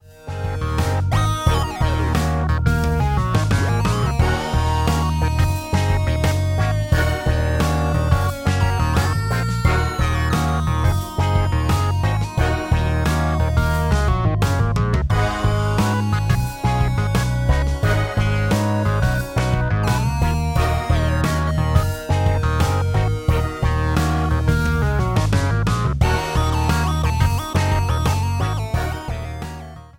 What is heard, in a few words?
Funk campus swing; MIUI12